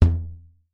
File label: rubber Band; gravity force lab